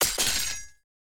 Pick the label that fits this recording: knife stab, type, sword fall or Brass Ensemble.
sword fall